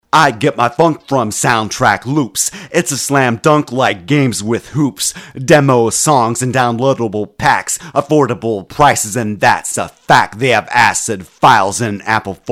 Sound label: Sound Track Loops 90 BPM